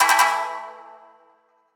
notice; freetel

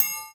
Blacksmith; Reality Bytes

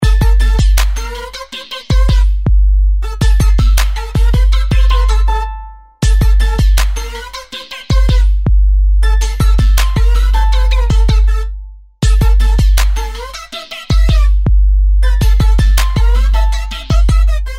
DETUNER; HTC; Ring Tone; Stereo; E-Club Ring Tone Pack 2

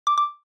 camera shutter; Alcatel; OT-995

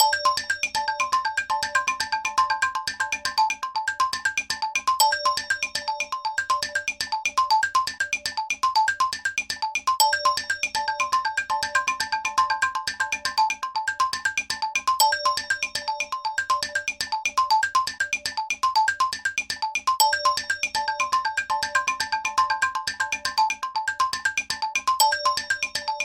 call mail; HTC; Infobar-A02

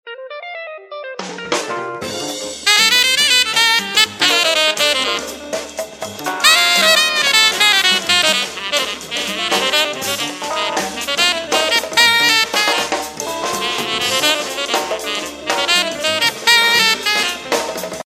Jazz Bar; Samsung GT-S7520U

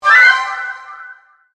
Woody Bird

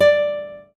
Classic Guitar

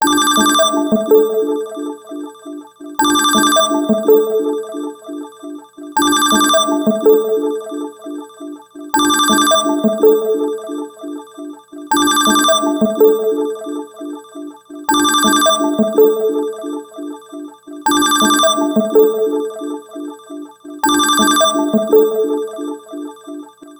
Frost, ringtones; LG E975 Optimus G

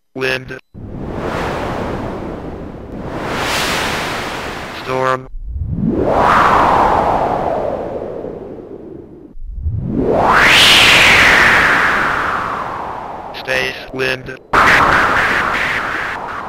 Noise

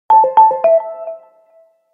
Alert

